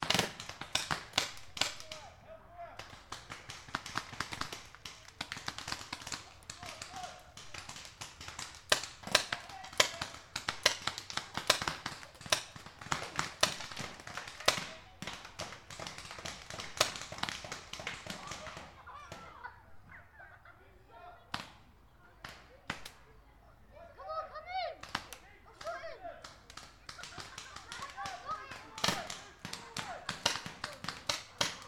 sport paintball battle forest children; sound effects one

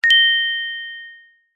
multimedia notification bell chime ping med decay

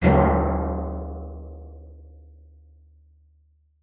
impacts metal hit drum gong like

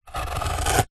office pen biro draw single line on thin wood

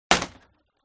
BOW & ARROW Impact Sweetener Wood Shatter Hard; WEAPONS

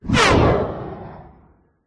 science fiction space vehicle whoosh past

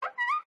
Wood Stress Creak Squeaks; DPA4060; pmsfx

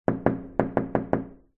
household window person knocking on window

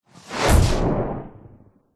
science fiction projectile incoming weird hit; zapsplat

